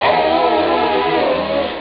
aaaiiaaah; Amiga Soundtracker Sample Packs

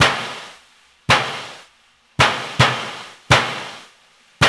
Hip-Hop Gong; Spectrasonics - Supreme Beats - World Dance

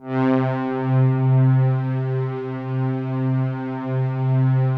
OB LOW P

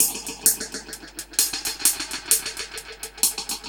Drums A Hats Echo 130, dub drums; musicradar

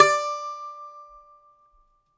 DOMRA D, Prima Domra, Plucked Strings; E-MU Producer Series Vol. 7 – Old World Instruments

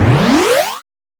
Arcade Alarm; Sonic Foundry (Sony Creative Software); Club Joints and Street Anthems; Exodus

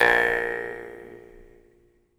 Jaw Harps

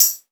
PERC, 160 BPM, Drum & Bass; Ueberschall; Drum & Bass Flash Trackz

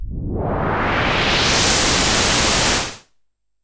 Pulse, Filtered Noise

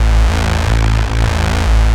bass porcelain reese looped; Polyend; Recue

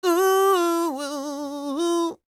SING, Vocal Planet R&B & Dance; ILIO

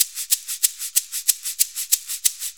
Shuffle Perc, Perc Loops

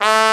THE TRUMPET

